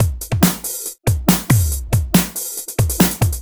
Loops BB 140, BreakBeats; Vengeance Samples

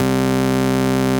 Basses Root C; Vengeance Samples

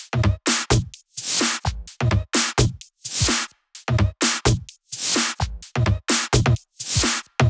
128BPM The Big Groove Kit; Vengeance Samples

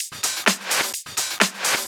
Electro Loop 128 BPM; Vengeance Samples

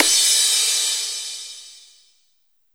Crash, Cymbals; Vengeance Samples

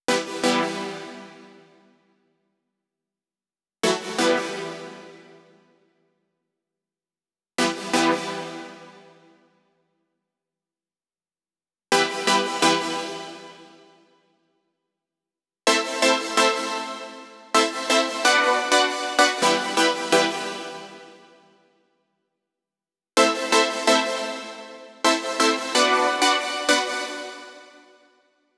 Synth Chords, 128 BPM; Vengeance Samples; VTDS2 Song Kits; Rap To The Top

